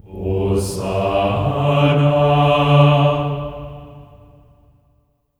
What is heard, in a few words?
HOSANA G3; Spectrasonics; Symphony of Voices; GR HOSANA